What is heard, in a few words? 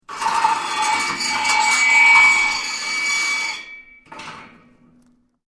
METAL SCREECH LONG LOUD; Materials